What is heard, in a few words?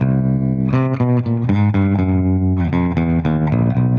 Baritone Guit, 120bpm